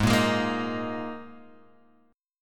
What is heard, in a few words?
Gsharp Suspended 2nd, Guitar, Open G; 6,3,6,3,4,x